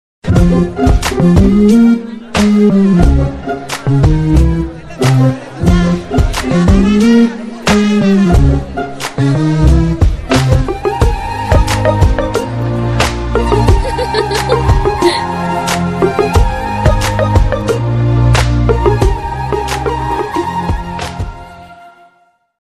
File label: tamil love bgm ringtone; Deepavali; Susi